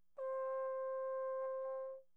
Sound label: Tenor Trombone, pp, C5; Brass